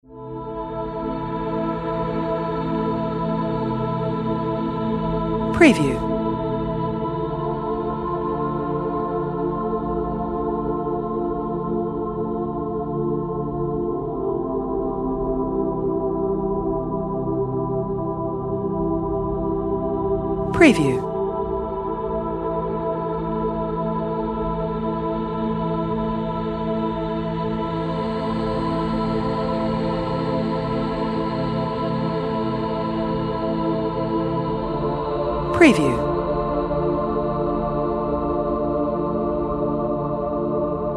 Ambient Calm Nature Relaxing Pads Background Music